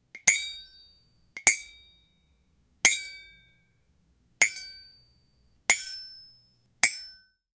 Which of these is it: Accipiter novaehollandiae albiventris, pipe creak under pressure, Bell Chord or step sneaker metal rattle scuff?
pipe creak under pressure